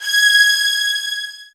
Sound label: SOFT VIOLINS